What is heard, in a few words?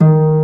HARP BASS, Electric Basses; PlatinumPhattCD2; E-MU Producer Series Vol. 8 – Platinum Phatt